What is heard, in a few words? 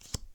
card reveal; cards